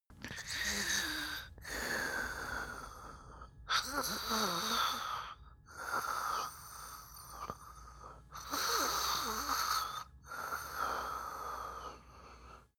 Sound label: male sleep breathe mouth; koerpergeraeusche; menschen; soundpool